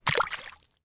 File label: impact stone drop into puddle